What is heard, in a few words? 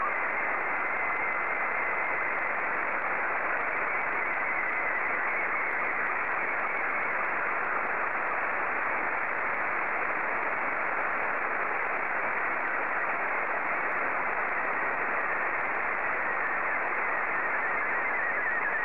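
Scottie 2, slow-scan television; JL1FQZ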